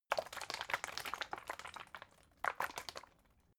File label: bottle water big handling; gastronomie; berufe